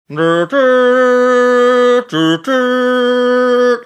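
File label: making shofar sounds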